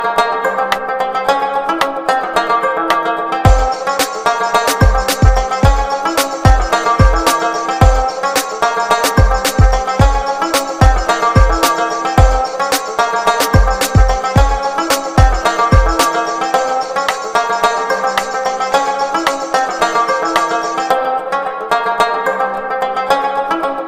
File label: new english ringtone arabic